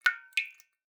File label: Drips